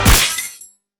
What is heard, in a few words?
Bullet hit glass; Hovl Studio; SFX Battle sounds; WhiteMan Unity2D